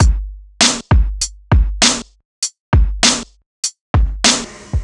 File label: Raw Brk HAT; Rawcutz - Nu Forms